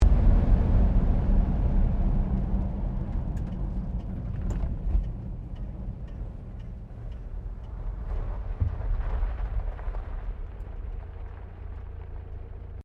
CAR HOLDEN FJ DECELARATE TURN, Classic Australian cars